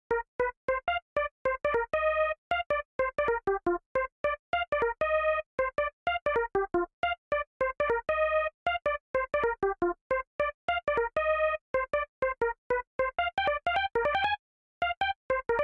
Melody; chippy

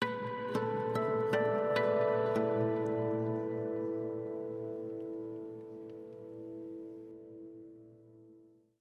Market Front

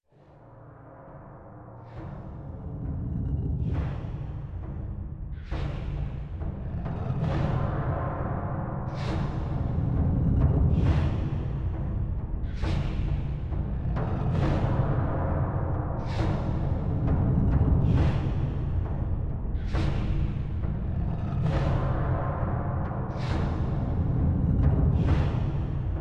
Ruins Front

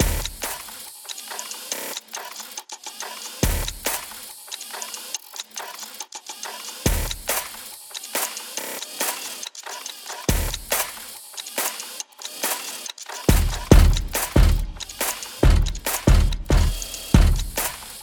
Percussion Loop; Dual Blade: Battle of the Female Ninja

